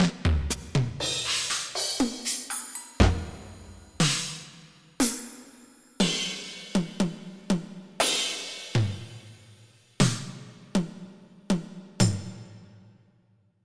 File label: Rat Drums Soak